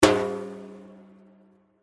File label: daf bak